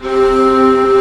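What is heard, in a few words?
strings C0 pianissimo; Sound On Sound - Peter Siedleczek's Orchestra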